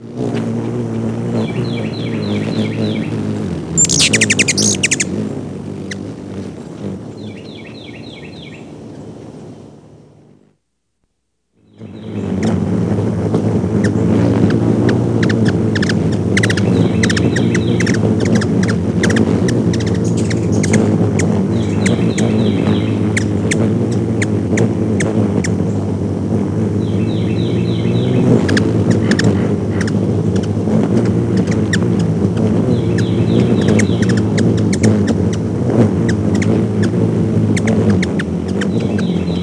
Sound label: RT Humm, Birds, Coastal Louisiana; Explore Coastal Louisiana with Boudreaux